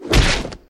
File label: Attack Fist